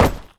dirt run

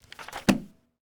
book use; Escape from Tarkov

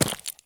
sprint slate; Escape from Tarkov

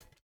footstep burlap metal jump start; Rust

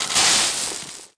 sand shot; World of Tanks Blitz

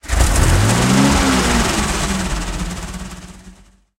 m4a3 engine load, engines tanks; War Thunder

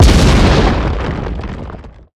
damage hits explosions

